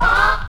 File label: buffalo